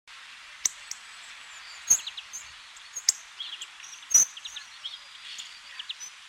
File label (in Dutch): Appelvink, trekroepjes